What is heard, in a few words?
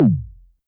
Tom Synth, YAMAHA RM1X